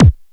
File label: Bd Blip, Kick Drums; Zero-G; Soundclash